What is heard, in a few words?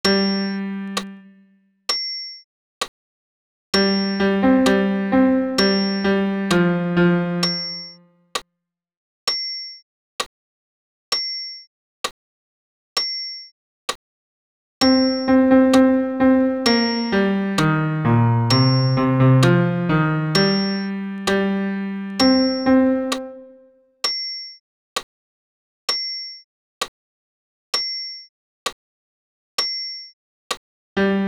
bas pian seul; Gloria